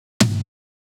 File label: drum Loop 140BPM Tom Short